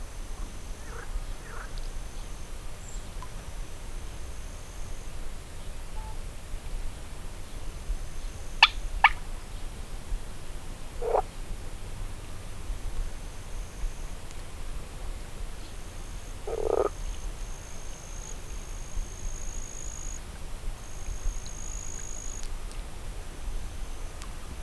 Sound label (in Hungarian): időnként kuruttyol, tavi béka; Poroszló; termeszetben